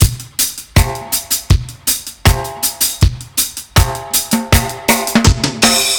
080 Roots Reg Drums; Digidesign; Pro Tools; Pro Tools Essential Demos; Essential Loop Demos; Demo Session - World

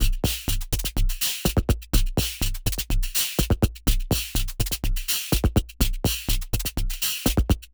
124 Dance Perc Beat; Percussion; Pro Tools Essential Loops; Digidesign

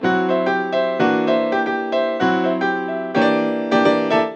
110 NuJazz Piano Dmaj, Grand Piano; Digidesign; Pro Tools Essential Loops

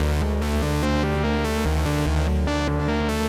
Techno Bass Bbmin; Dance DJ; Digidesign; Pro Tools; Pro Tools Essential Loops

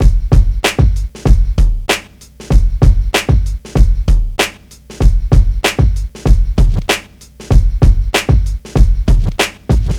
E Coast HipHop Drums

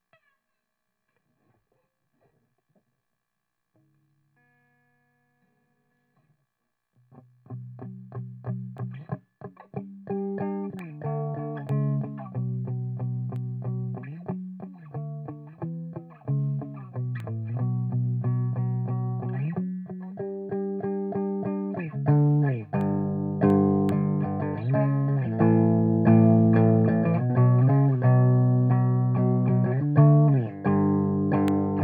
rythm

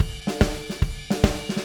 145 Texas Shuffle Drums; Very Fast Tempo; Pop Rock; Pro Tools Essential Loops; Digidesign

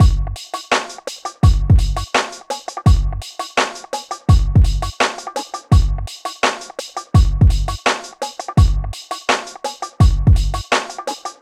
Hip Hop Beat, Drums

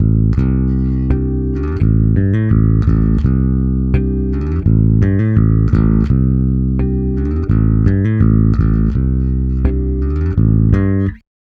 084 Pop E-Bass Amin; Pop Rock; Pro Tools Essential Loops; Digidesign

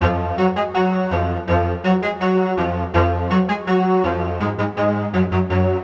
HipHop Synth Fmin; Digidesign; Pro Tools Essential Loops

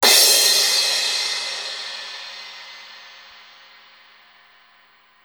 137 garage crash; Dance DJ; Pro Tools Essential Demos; Digidesign; Essential Loop Demos; Demo Session - Dance DJ

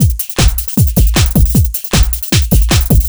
Dance Beat; Dance DJ; Drums; Beats; Pro Tools Essential Loops; Digidesign

